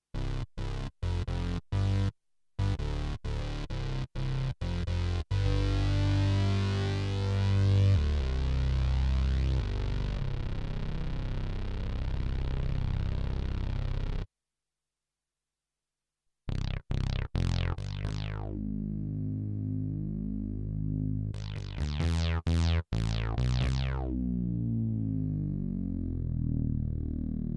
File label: SP5 Bass Synth